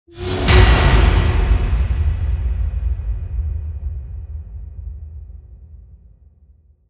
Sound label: Trailer Tools Metallic Hit; Production Music; CATastrophic